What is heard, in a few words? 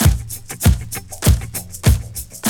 Loop; Sound & Vision - Gigapack I